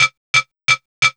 DRMSND, Underground Hip Hop, BEATKIT

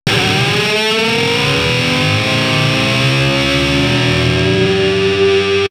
Pluck; Coldcut's Kleptomania VOL1

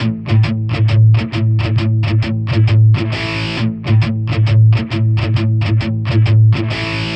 Distorted Guitar 140BPM Amin; Distorted Guitars; Vengeance - Essential Clubsounds Vol.4; Guitarloops

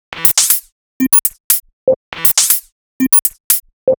Buzzer, Percussion, 120 BPM; Sonic Foundry (Sony Creative Software); Underground UK House & Electro

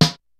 kicks snare, kid; Kid Nepro; Elite; KICKS SNARES

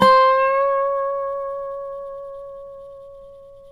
SLIDE, Dobro Slides

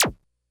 Bleep; Korg DS-10

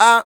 FEM PERC, Vocal Jazz FX

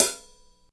HAT 2; East West - Phat & Phunky CD2; 90's Sample CDs

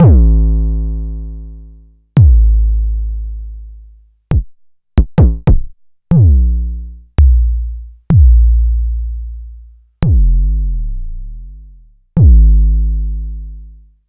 Jomox Mbase01

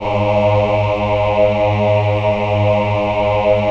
VIB G#2, VIBRATO, Voices; Spectrasonics

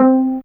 Cell Piz 1 C5, Fairlight CMI IIe Pizz Str

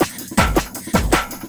Loop, Drum & Bass, 160 BPM

